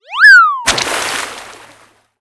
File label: Ball Water Walk